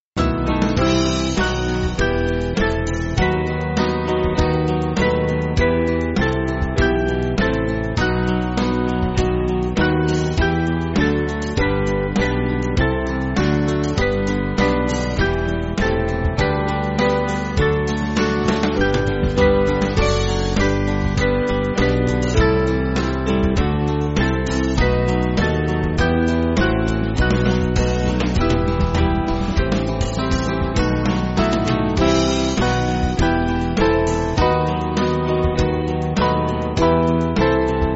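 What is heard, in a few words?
Music Backing Files for Churches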